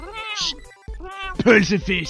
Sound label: miav